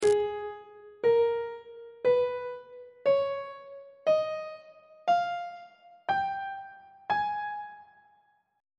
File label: g sharp melodic minor scale reverse descending on treble clef